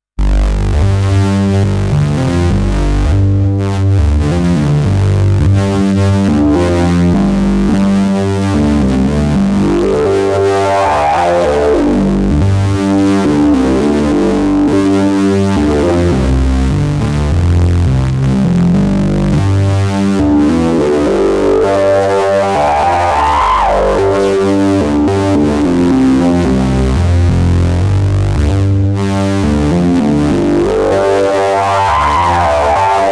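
Parallel filters 4pole, Paraphonic Dual Filter Analog Synth; uno synth pro desktop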